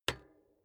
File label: bicycle street kickstand hinge up soft; fahrrad; verkehr transport